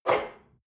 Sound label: espresso grab from table hard, restaurant cafe